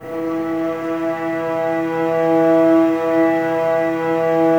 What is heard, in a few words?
STR VAS E, String Ensembles, Violas, No Vib; Miroslav Vitous